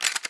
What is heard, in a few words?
pick Shotgun